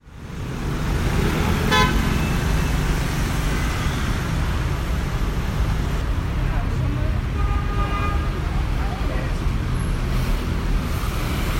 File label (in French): Klaxons Naples